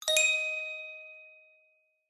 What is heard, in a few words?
multimedia correct ping tone